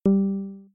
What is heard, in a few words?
simple Drop; phet io wrapper sonification; balloons and static electricity